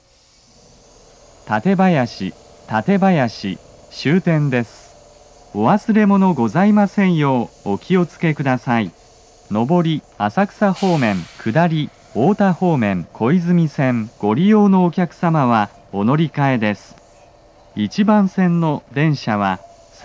tatebayashi local kuzu; station

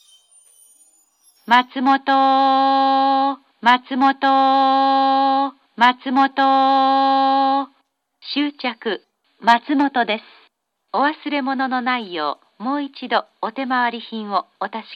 matsumoto turn local shinano-omachi, station; jr-east

